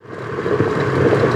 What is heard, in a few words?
cooking Fade in; Singles Flirt Up Your Life